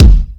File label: Electronic Kick; Yamaha PSR E305